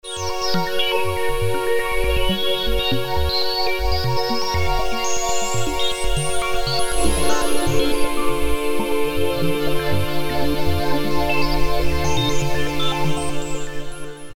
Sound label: Pad, Alesis Micron; Factory Programs; AnotherLongDay